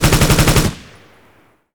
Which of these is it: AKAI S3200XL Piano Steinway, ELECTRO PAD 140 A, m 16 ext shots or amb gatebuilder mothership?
m 16 ext shots